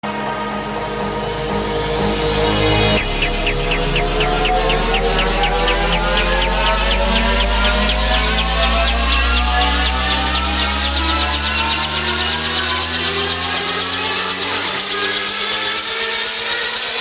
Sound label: sparrow iphone ringtone